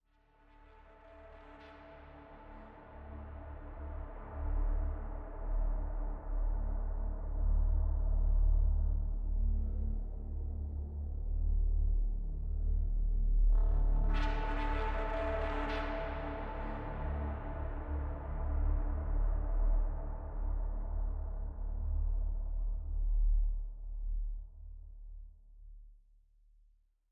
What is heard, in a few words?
Spooky Ship Mood